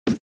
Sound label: SNES Action Block